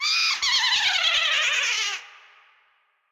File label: Boo Laugh